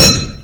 Stone Metal, collision sounds; opensim; CollisionSoundsAssetSet

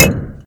Stone Glass, collision sounds; opensim; CollisionSoundsAssetSet

